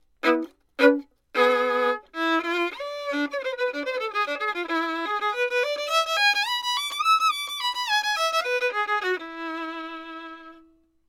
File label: violin; lewitt